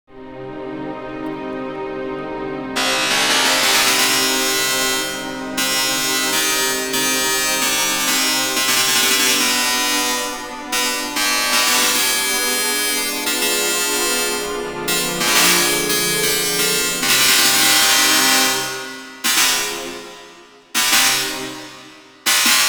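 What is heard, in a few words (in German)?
serenade für Orchester und Rasembalo; speedcomposition april 2018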